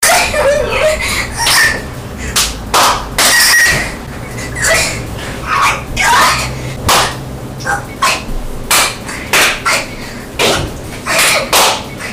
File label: slap